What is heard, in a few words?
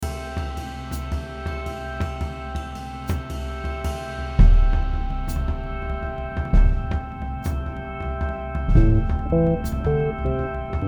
instrumental music